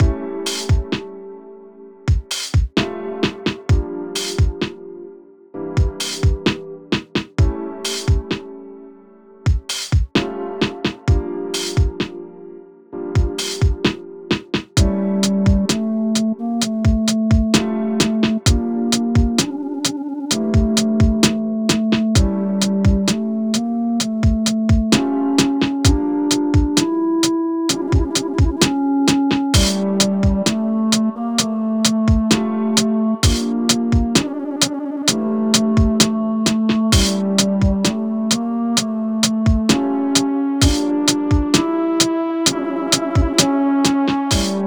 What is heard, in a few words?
Desert Overworld